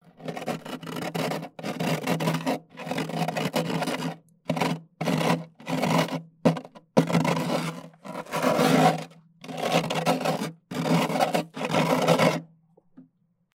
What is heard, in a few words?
Scratching Wood